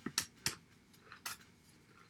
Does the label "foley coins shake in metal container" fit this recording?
No